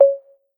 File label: Tone